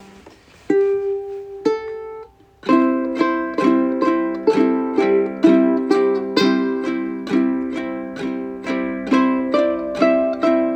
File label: Uke, she'll be coming round the mountain; Uke book